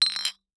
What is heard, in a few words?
Impact Glass Bottle Clanks; Impacts